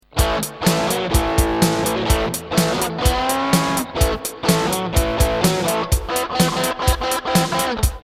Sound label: Strat Dist